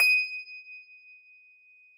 D5, Harpsichord; Harpsichord -ped; Total Piano

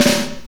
snare R B, Roland snares

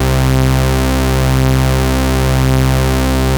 MOOG PRO, BASSES

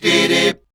Voice Spectral, H PHRS; Best Service; Voice Spectral II

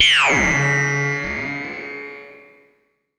Simple OSC Spring Reverb

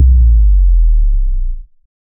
D+B; Best Service; XXL Production Effects; DANCE; Sample CDs

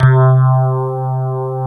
FM ELP 2 C2 L, Keyboards Old School; USB Soundscan vol.09; AKAI

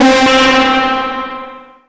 SYN, Jungle; E-Lab; XTC Files of Jungle

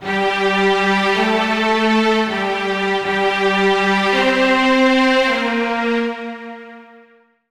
Thunder Strings; Zero-G Smoke

